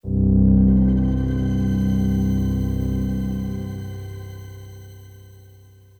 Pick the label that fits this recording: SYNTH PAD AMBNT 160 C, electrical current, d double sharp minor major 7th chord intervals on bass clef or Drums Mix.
SYNTH PAD AMBNT 160 C